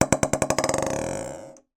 foley bauble decoration christmas down bounce fast table; zapsplat